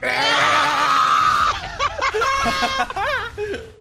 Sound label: eughahaha; soundboard